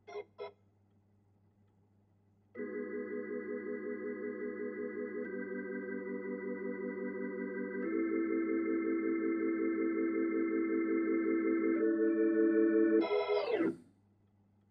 Hammond

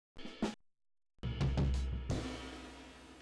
Drums Verb

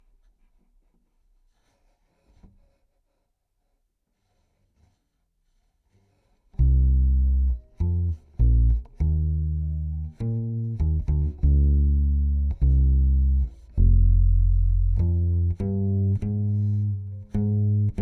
C Bass DI

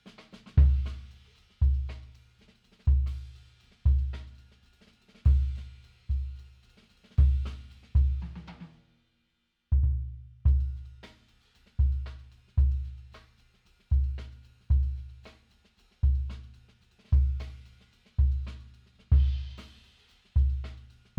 BD In

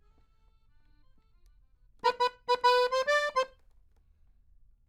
Accordion; Jazz

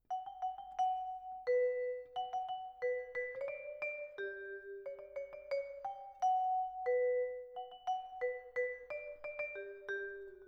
Vibra, Twin F

